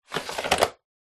household washing machine detergent tray slide close